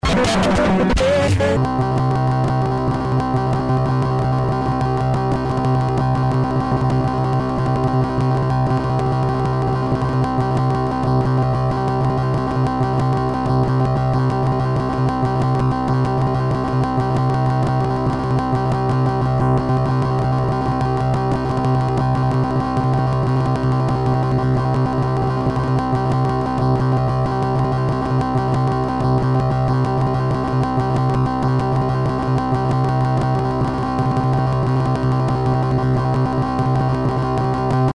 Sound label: Arrhythmic Flow